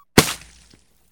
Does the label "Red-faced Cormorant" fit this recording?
No